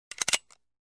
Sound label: Revolver Cocked Hammer